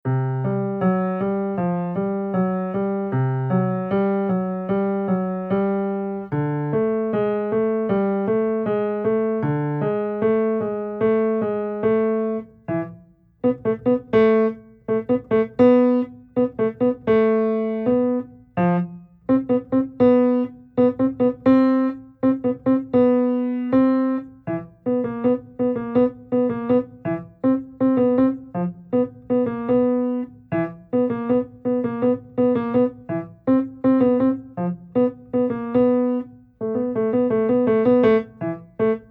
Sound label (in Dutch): Eerste en tweede vinger linkerha, Piano oefeningen